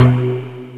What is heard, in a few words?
BARREL, K1 KAWAI; East West; Global Sounds